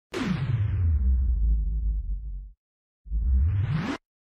Slow motion

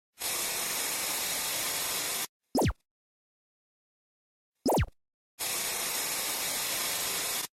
TV Turning Off and On

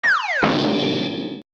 Falling Sound Effects

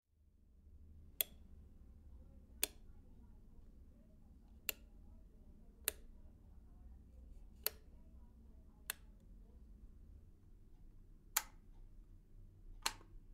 Light Switch sound on off; house, lamp

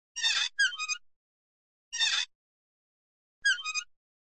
Squeak Faucet Wheel Turning Sound Effect

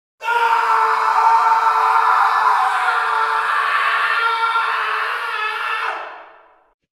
Man Screaming Sound Effect

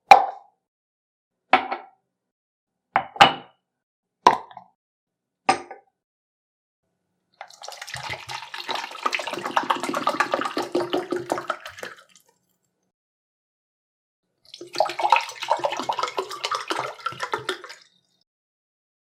cup all sound; Glass cup and Saucer; صدای استکان، فنجان و نعلبکی

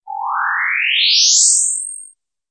get invisible sound effect

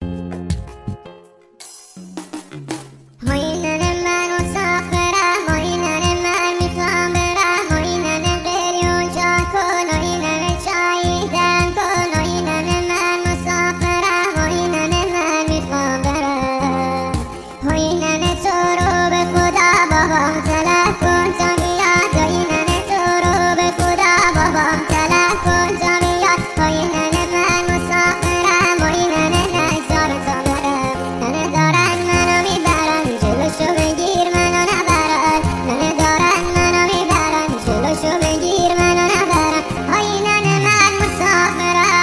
kid sound

